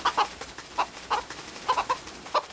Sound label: chicks; lego my world first steps